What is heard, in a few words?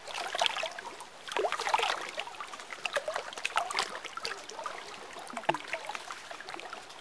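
water lake; envrn; The Elder Scrolls III Morrowind GOTY; XBOX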